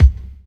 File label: tight kick drum single hit c sharp key